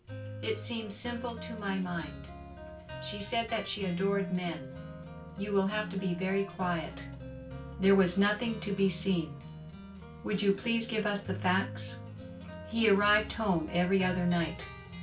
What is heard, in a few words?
office gitar